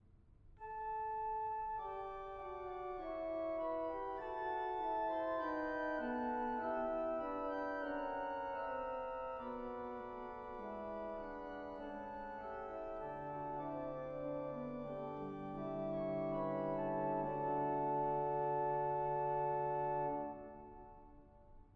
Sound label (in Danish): Dagen går med raske fjed forspil D dur